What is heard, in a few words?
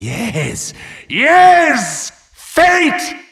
wheel spin